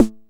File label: 909 SD, TR-909; Best Service Dance Mega House Dance